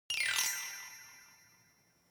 ui notification glockenspiel sparkle slide down soft